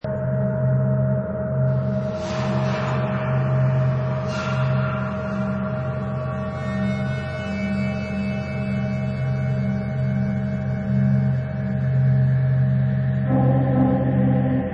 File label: Horror Scifi Soundscape Loop; Horror Scifi Soundscape - The Meat Locker; Cold and icy soundscape, twitching metal slides and grinds; Scary Soundscape Sound Effects